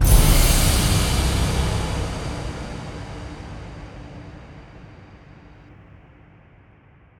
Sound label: free Spin Win; conan